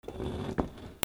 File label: FRICTION